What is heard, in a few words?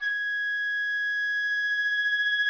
clar